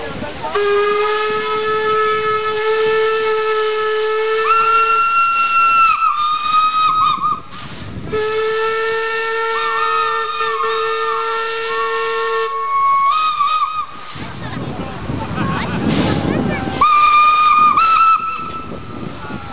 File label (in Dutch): station fluiten